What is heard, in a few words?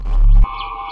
electro magnetic pulse blast; Electro and Synthetic